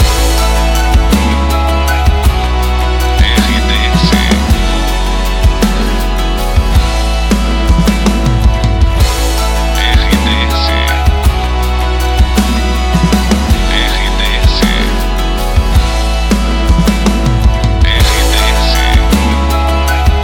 trilha para locução noite de amor; Boa FM 2020

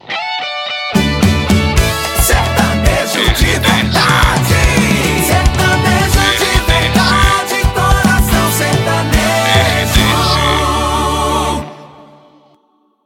coração sertanejo

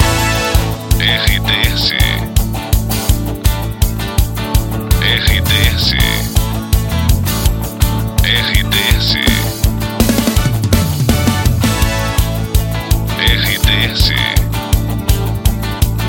trilha para locução; Pacote Princesa FM, Rodeio/SC. Estação com características popular

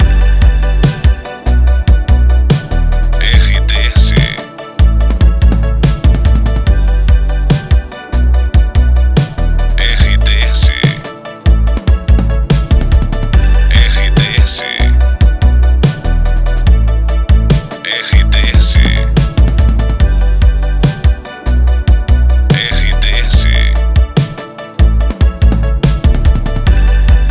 trilha para locução festa; Boa FM

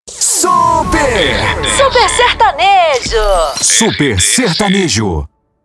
PONTE SUPER SERTANEJO

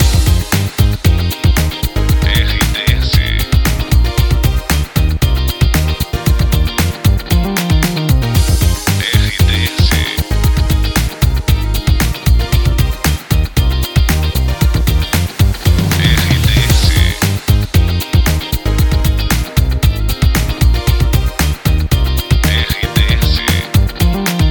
trilha locução news; Pacote 012 News, de São José dos Campos/SP Estação com característica All News

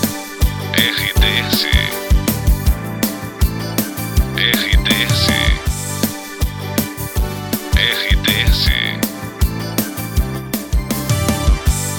LOOP TRILHA PARA LOCUCAO

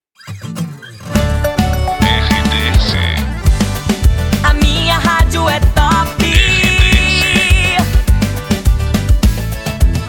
saída de bloco; Top FM

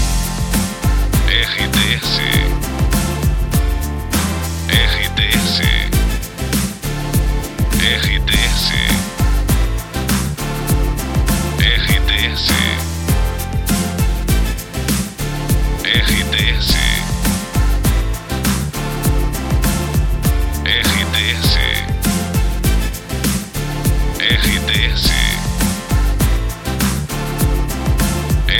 TRILHA PARA LOCUCAO WEB NET JOVEM

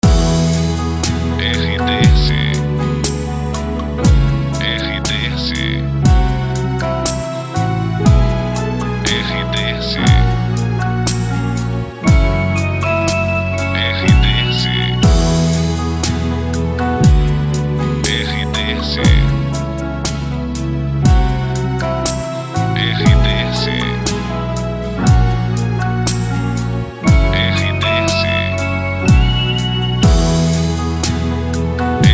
TRILHA PARA LOCUCAO; Pacote Web Net; Web Rádios

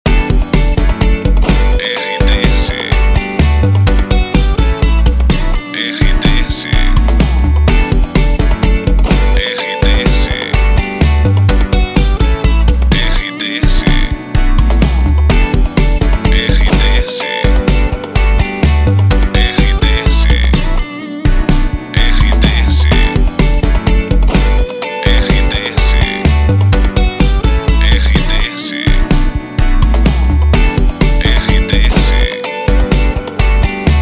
trilha para locução; Difusora FM

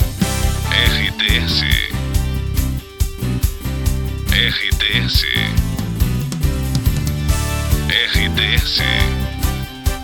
LOOP TRILHA LOCUCAO

